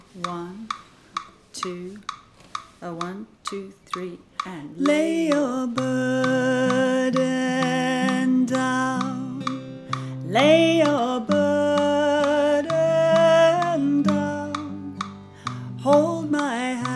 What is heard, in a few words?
alto melody with click